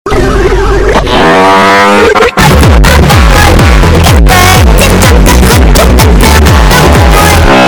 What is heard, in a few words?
goofy beat